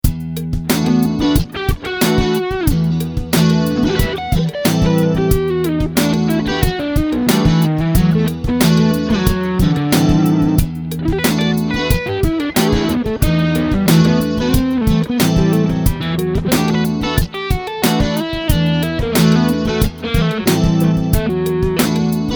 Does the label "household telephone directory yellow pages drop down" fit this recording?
No